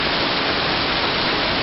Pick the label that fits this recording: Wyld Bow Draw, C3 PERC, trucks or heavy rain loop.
heavy rain loop